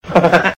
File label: laff; farley